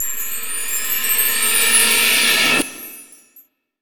Rev Crash Chimes, Drum Hits; Zero-G; Soundclash

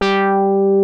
FAT BASS, BASS STATION; Syntec; Wall of Sounds

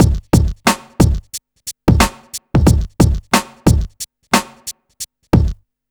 90 BEAT, Hip Hop R n B; USB Soundscan vol.19; AKAI

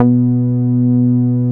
MOOG B3P; 90S MOOG

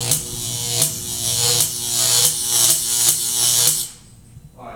TESLA, Science Fiction; E-MU Producer Series Vol. 3 – Hollywood Sound Effects; Tesla 10 -19

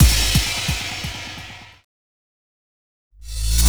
Crash Kick, Afterhours EDM

